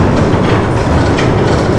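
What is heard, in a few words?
IRON DOOR; outlaws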